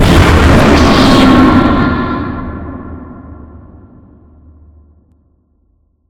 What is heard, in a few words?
Jumpscares; Five Nights at Ronald's